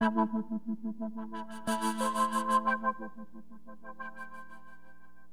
LFO, Keyboards; HipHop; LiveAct Pool 1

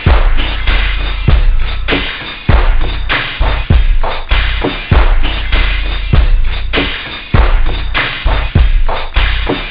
beat, house; OctaMED SoundStudio V1

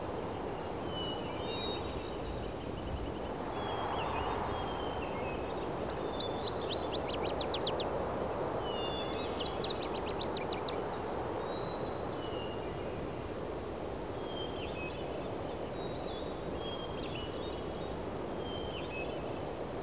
mix ambient; SGI O2 Out of Box Experience 2.2; courtyard

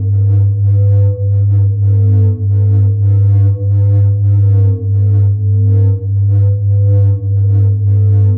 powerup loop; romulus; Earth and Beyond; EA Games

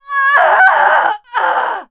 death; PC Gamer IT CD 41; Daikatana; mikiko

